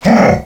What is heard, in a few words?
hurh; DS; ML BOWSERS INSIDE